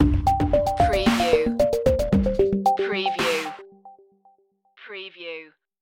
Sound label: digital ident